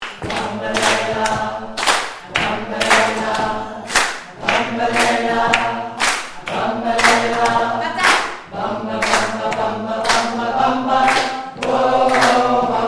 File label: Tenor